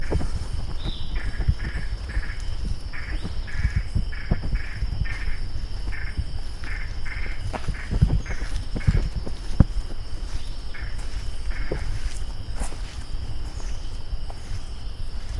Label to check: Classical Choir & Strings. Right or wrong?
Wrong